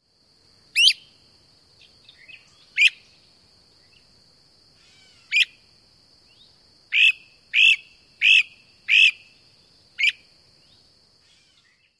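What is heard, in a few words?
Great Crested Flycatcher, weep and prrrt calls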